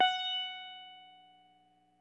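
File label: electric sitar soft; Quantum Leap - Guitar & Bass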